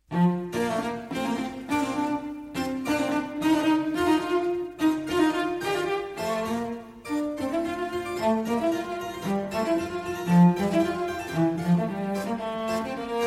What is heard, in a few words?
Cello Sonata in B flat major, Allegro; Vivaldi; Cello Sonata No.4; RV45